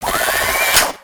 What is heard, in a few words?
Spider Boss Fire Rain; creatures mechanical